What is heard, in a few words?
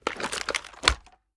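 sniper raise; gmod; iw8; xmike109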